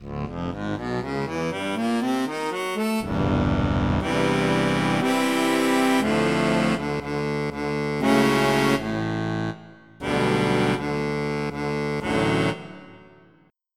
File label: Harmonica; Harmonica 2 Octs